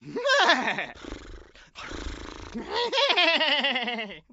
horse med howl

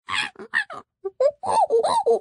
monkey long howl

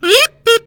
sound kazoo

